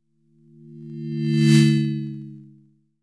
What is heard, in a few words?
SPACESHIP; AKAI S6000 CD-ROM - Volume 6